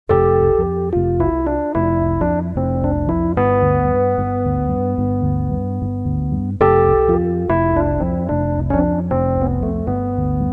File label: Rhodes Song